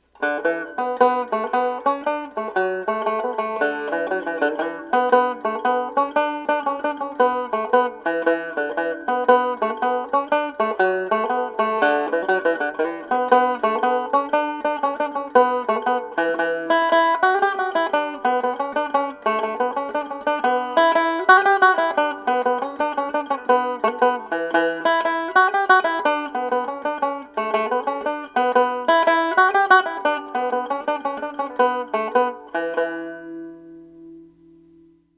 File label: 1922 vega style r whyte laydie tenor, Banjos; Tuned D-G-A-E